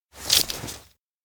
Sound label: medicine blister; anemoi